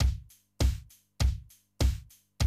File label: drum loop, 100 BPM